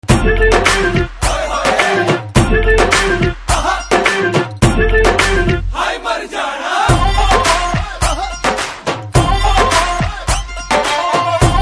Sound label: BGM Drum Beats Ringtone